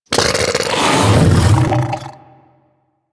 Vocal Burp Attack, critter; Warframe; Hunting Critters; Undazoa

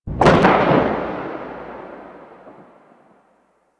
Gas Dist Cloud Explosion; Ambience; Warframe; Corpus Gas City

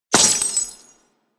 Glass Shotgun Projectile Hit; Warframe; Tenno; Weapons

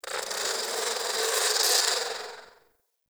Garden Fly; Warframe; Ambience; Clan Dojo; Dojo Garden Animals

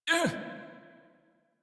Pain, Barks, Battle; Warframe; Baro Defense

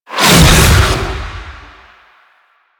Infested Oni Melee Swing; Warframe

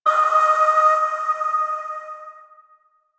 Void Shawzin Oct Three D Sharp; Warframe; Zariman

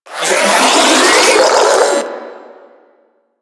Spin Scream; Warframe; Proto Infested Ancient; Abilities

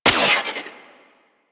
Prime Dual Soma Shot 2D B

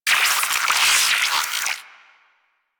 Tiny Creatures, Ambience; Warframe; Corpus Railjack

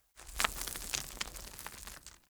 Cliff Rock Debris Small; Ambience

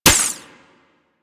Sapient Pistol Mech; Warframe

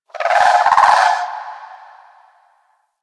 Forest Creature Mid Far; Warframe; Grineer Forest

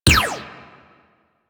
Shot; Warframe; Tenno AR; Prime Tenno AR

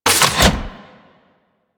Medium Door Close; Crp Gas City; Corpus

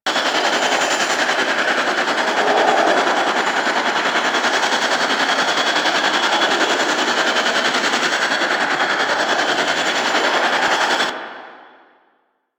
Movement Slow Engine Loop; Warframe; Vehicles; Archwing Demolition

